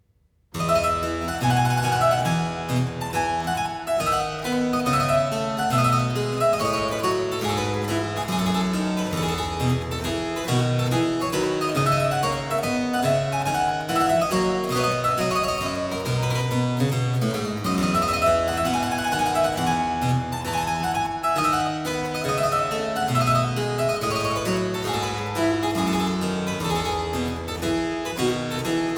Air, harpsichord; Complete Chamber Music; Brilliant Classics; Miscellaneous pieces for the harpsichord

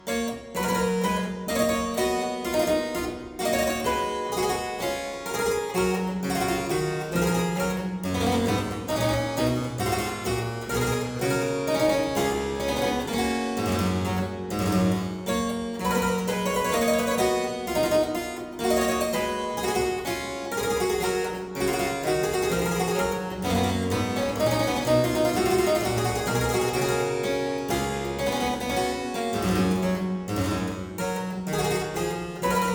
Aria in G major Partita 23, Harpsichord

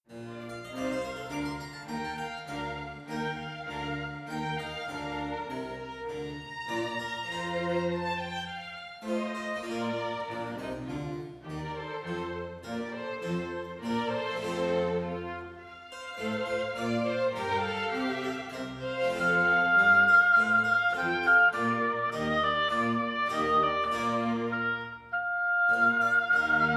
Oboe Concerto in B flat major - Adagio; Handel; Oboe Concerto No. 1; HWV 301

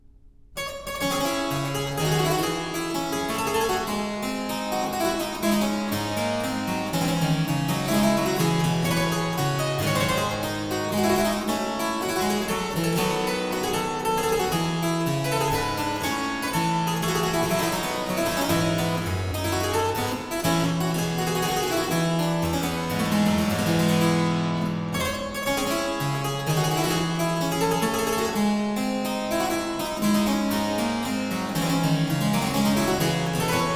Suite in D Minor Courante, Harpsichord; Buxtehude; Opera Omnia; Harpsichord Works

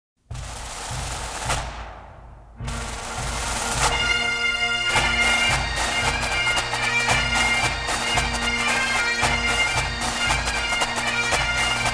Massed Pipes & Drums; The Bagpipes and Drums of Scotland; Kate Dalrymple; The Kilt Is My Delight; Celtic

